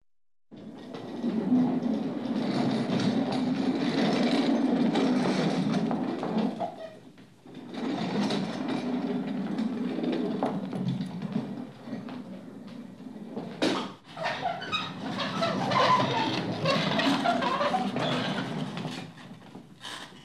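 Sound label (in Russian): тележка для мусорного бака скрип колес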